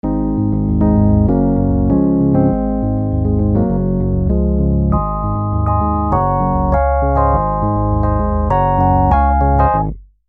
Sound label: Nord EP9 Stockholm